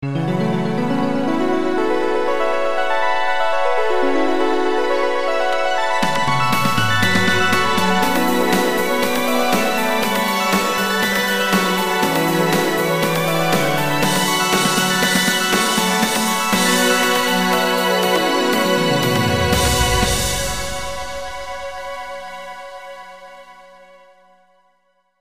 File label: jingle